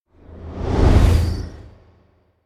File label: Whoosh By Swift Fast Deep Whistle Reverberant; Hollywood Edge; sound designer's toolkit v2